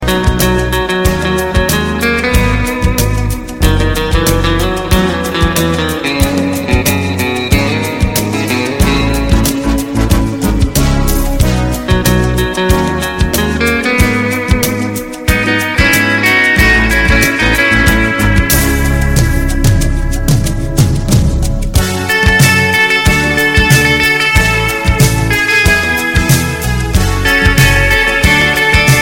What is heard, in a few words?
Africa Soft